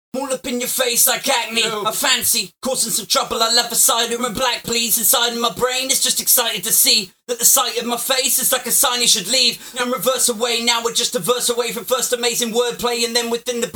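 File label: hip hop 100 B minor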